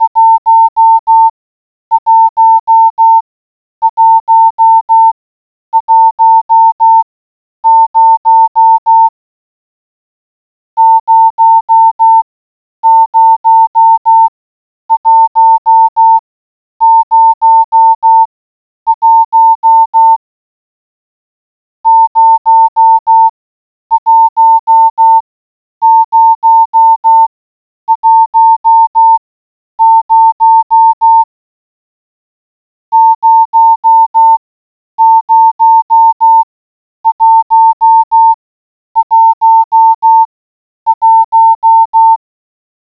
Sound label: morse code